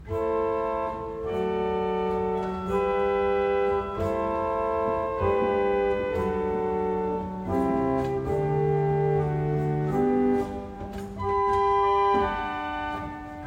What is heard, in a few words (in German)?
Dolce 8, Orgel; Dettenschwang; Renovierung